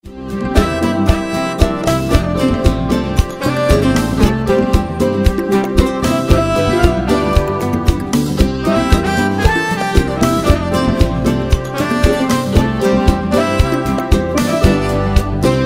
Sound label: pennywhistle